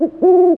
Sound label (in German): uhu; de scheiterhaufen2